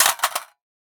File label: rifle magdrop; gmod; arccw uc commons attachments